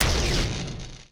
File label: shock fire layer